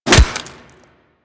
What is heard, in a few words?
Melee Staff Impact Flesh; Warframe; Weapons; Staffs